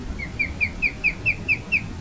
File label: osprey